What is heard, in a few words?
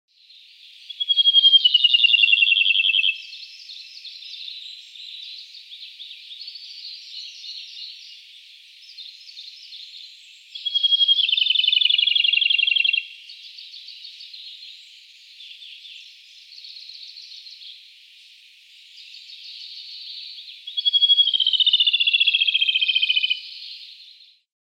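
Siberian blue robin voice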